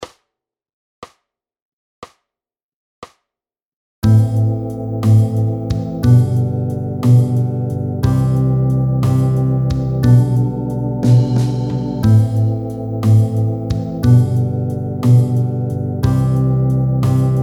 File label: blues